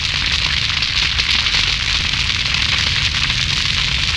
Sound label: fire; StarSiege